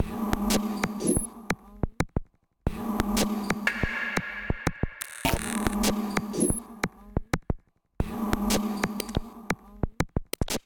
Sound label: Glitch Kit groove 90 bpm; MIDI Clips; 89 - 120 bpm; Technical Glitch Kit; Ableton; Glitch and Wash